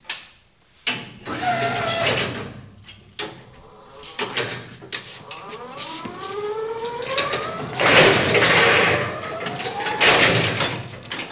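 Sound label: Forklift Electric Movement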